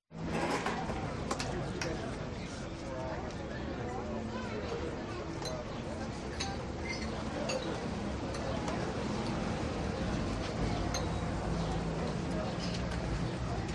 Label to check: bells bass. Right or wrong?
Wrong